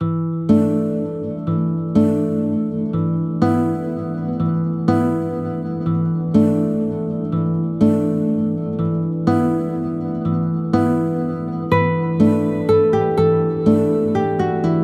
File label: awai bpm 123